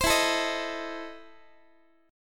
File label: E Major 7th Suspended 4th Sharp 5th, Guitar; EM7sus4#5 strummed